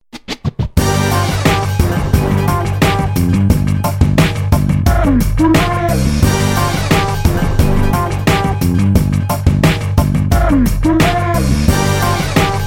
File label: Power Punch (logo); MIUI12